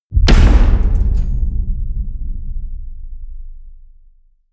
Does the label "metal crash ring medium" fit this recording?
Yes